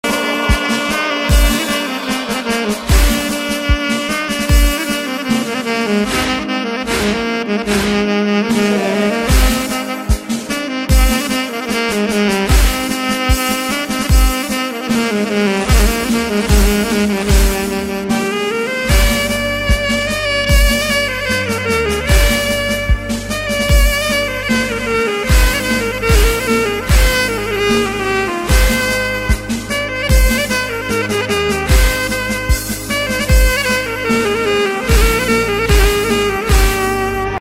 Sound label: Ringtone